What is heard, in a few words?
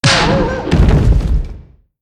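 giant hound death, monster; Senillinea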